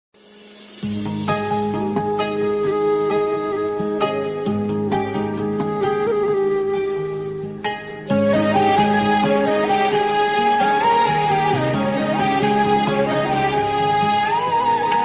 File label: instrumental ringtone